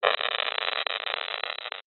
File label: science fiction radio signal interference burst